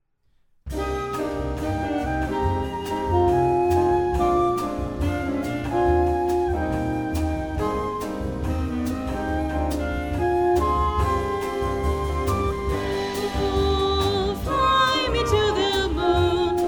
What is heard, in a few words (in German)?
Sopran Uebe-Track